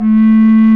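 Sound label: recorder A, woodwinds; Roland - LA Composer vol. 1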